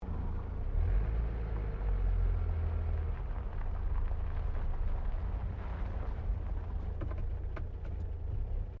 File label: CAR HOLDEN FJ REVERSE GRAVEL, Classic Australian cars; Holden Fj 1955; Vehicles